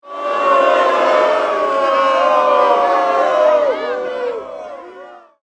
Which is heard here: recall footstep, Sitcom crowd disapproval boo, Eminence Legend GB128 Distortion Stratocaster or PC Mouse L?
Sitcom crowd disapproval boo